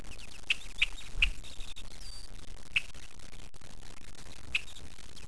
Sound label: Red-winged Blackbird